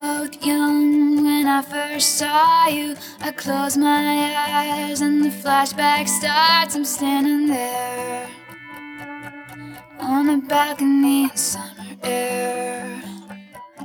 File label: lovestory vocals